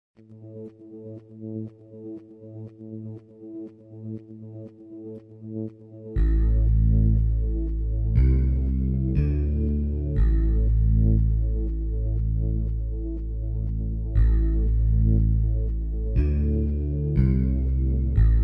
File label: roland vsc software synthesizer with annoying midi; midi sounds